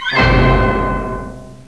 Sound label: Dramatic Orchestra; Jim Heid’s Sounds